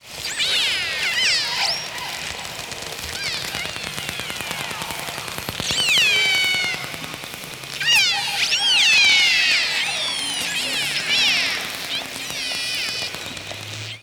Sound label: killer, dolphins